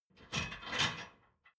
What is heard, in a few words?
dishwasher frame fold down soft; maschinen; spuelmaschine